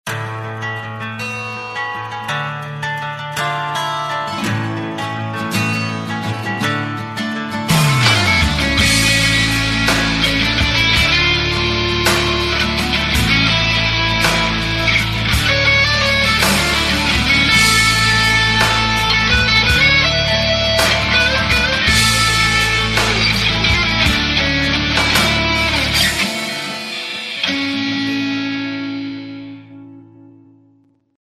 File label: midi ring; LG enV2